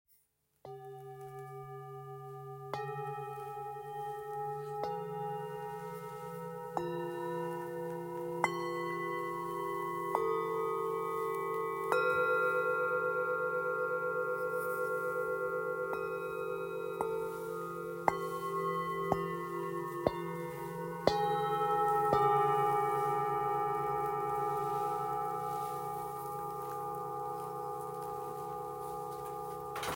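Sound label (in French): Set 7 bols, bols chantants tibétains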